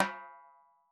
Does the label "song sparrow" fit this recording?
No